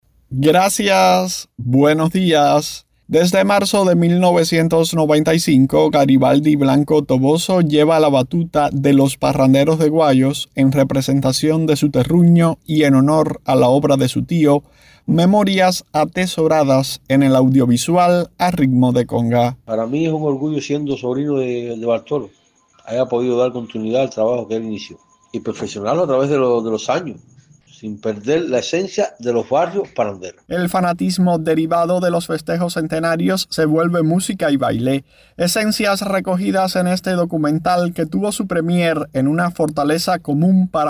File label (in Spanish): conga; GUAYOS; Identidad y orgullo arrollan en conga de Guayos